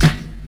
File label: BELLS SD; 64 BELLS